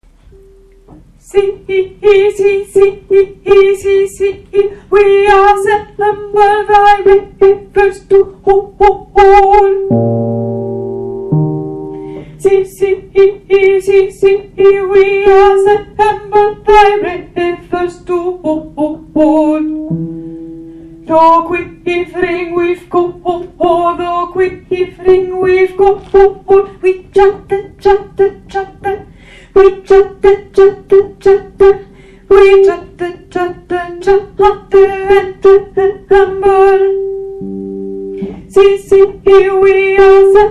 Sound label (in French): choeur alti; choeur du froid